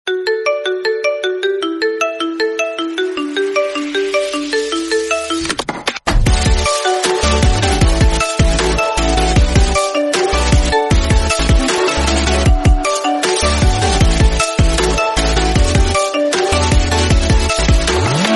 redmi note 9 pro, ringetone

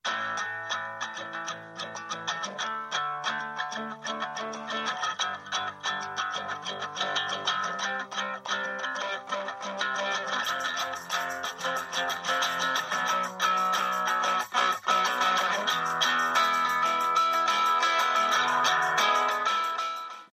guitar, ringetone

